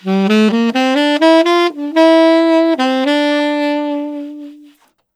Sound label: Tenor Sax Phase; AdamInTokyo; pitchglitch